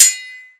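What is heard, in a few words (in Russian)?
меч звонкий далекий яркий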